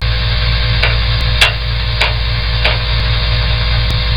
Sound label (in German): Ton Kompressor; Riedlhuette; Feldbahn; Gleisbau